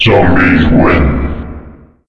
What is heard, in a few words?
zombies win; cstrike; basebuilder